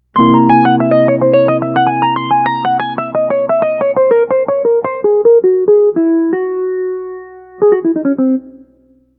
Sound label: Dominant Alt